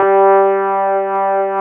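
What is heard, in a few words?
Wurlitzer G2 - L; USB Soundscan vol.09; AKAI; Keyboards Old School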